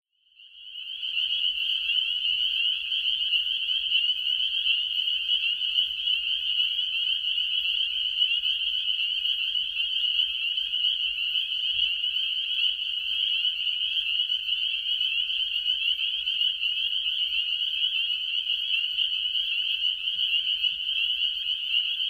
Spring Peepers pond by Teawaddle Hill Road

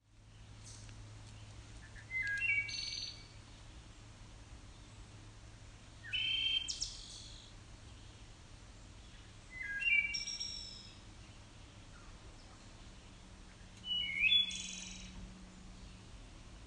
Wood Thrush Long Hill Road

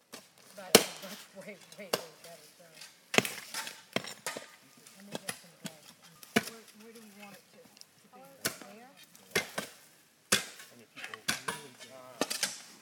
Building Bog Bridges Rattlesnake Gutter Cave Hill

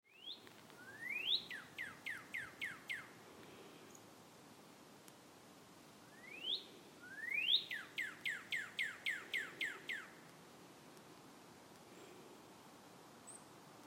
Cardinal

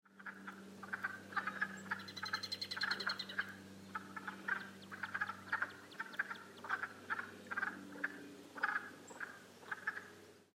Wood Frogs Downy Woodpecker

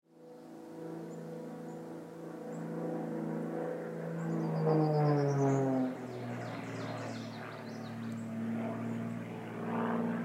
Stunt Pilot, Seasonal Sounds

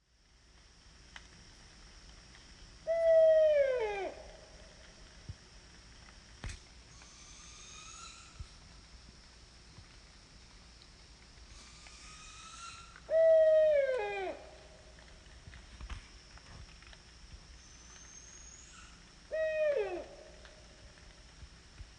Barred Owl in the woods; along Montague Road

